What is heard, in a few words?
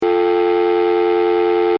household telephone dial tone, Telephone Dial Tone (Uk); Telephone Dial Tone ( Uk Version ); Telephones; Electronics; McKinney Sound